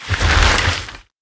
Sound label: summon; mecromancer